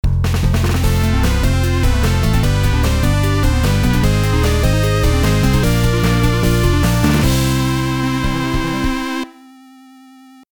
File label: Level Clear; sonic 1 dragon edition ost volume 1